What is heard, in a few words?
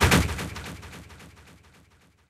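ricochet hit modules, tanks; killscene; War Thunder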